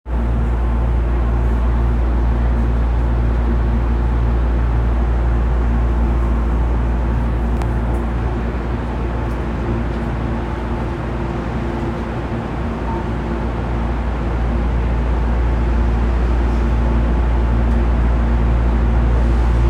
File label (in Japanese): 副都心線渋谷北